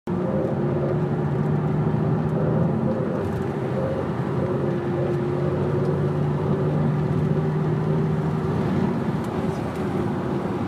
audi a3 8p 2.0 tdi dsg strange sound coming form engine bay